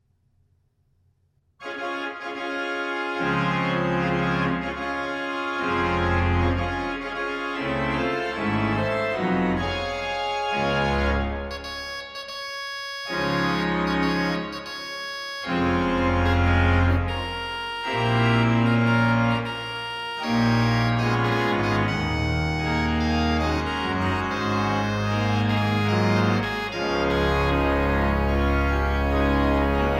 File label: Fanfare